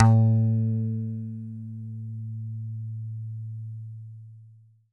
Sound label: E-GITARRE, Alpine Volksmusik; Best Service